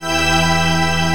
DIDI, SYNTHESIZER, DIGI WAVE; AKAI S6000 CD-ROM - Volume 1

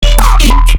bass hit radar E, Bass Stabs; Virtual Riot

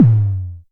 tom, 5U Modular Drums; Polyend; Simulacrum Sounds; 1 Shots; Synths

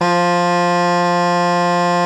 BASS SHAWM; SoundBytes - Early Patches

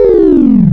Ring Mod Extract, wavetables; Animoog

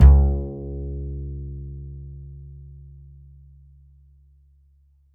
A, Upright Acoustic Bass

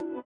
sitar, Indiatek; Spectrasonics; Metamorphosis

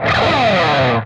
PRS SLIDE, guitar

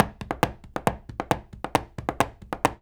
133 STEPS, Spanish And Gypsy

